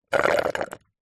drink slurp soda dregs ice through straw fast food; human; zapsplat